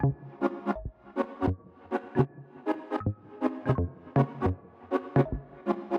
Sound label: music main game chords; netent